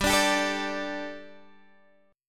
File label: G Suspended 2nd, Guitar DADGAD; chordshape; down